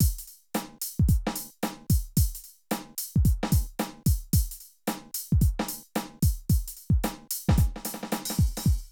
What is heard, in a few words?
Drum loops, Streetsoul